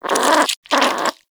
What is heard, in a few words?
Vocal Snore, Critters; Characters; Hunting Critters; Warframe; Nexifera